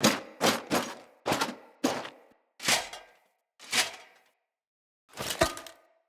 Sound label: Mace Entrance Foley; Level Events; Midrath